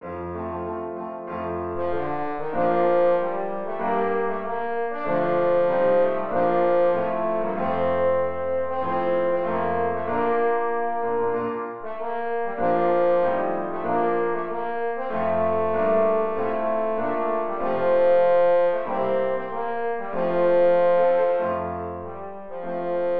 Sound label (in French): hymne national de Belgique; van campenhout francois